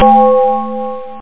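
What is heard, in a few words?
tubular bell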